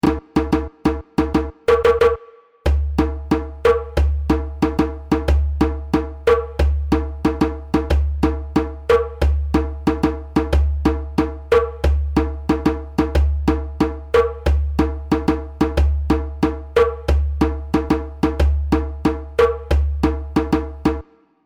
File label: fire djembe